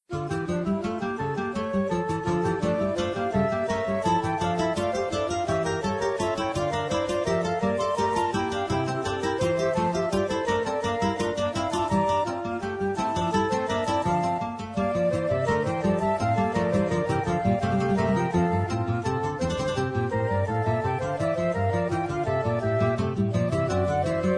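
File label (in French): inventions pour guitares flûtes; bach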